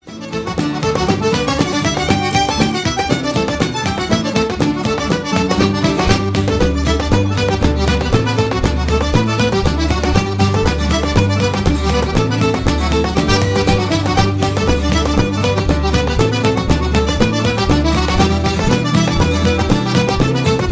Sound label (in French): Trio Sol La Ré